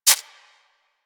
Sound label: Rodent Step Dirt; Warframe